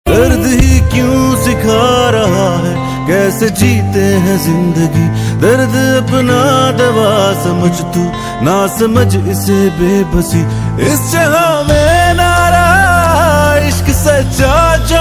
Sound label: pakistani ringtone